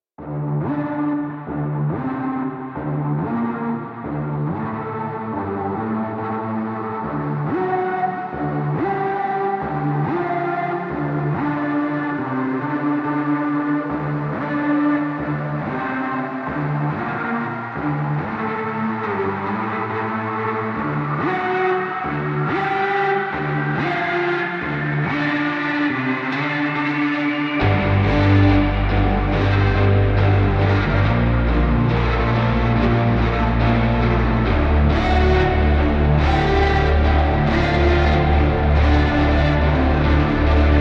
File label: Hub Amb Cue, Music; Dynamic Music; 1999